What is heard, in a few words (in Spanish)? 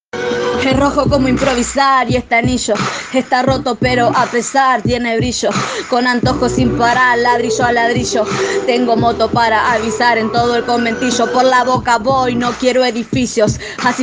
Rap Improvisacion; Taller de Rap 2020